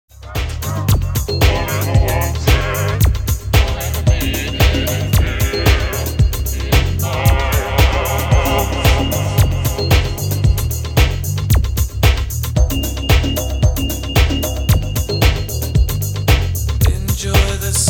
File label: bassline